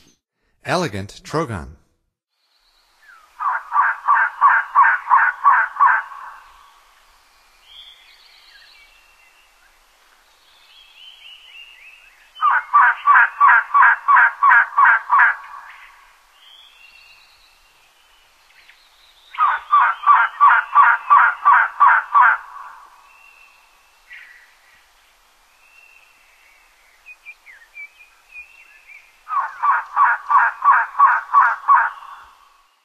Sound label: Elegant Trogon; North America; Stokes